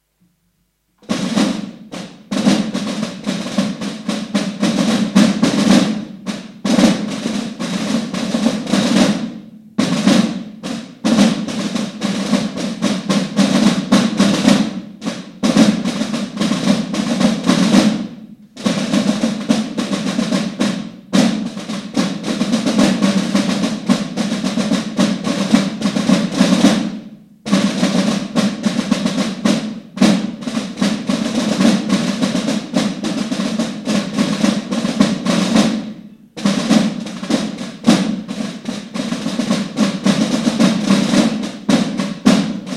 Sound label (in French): Jeunes tambours; concert annuel 2013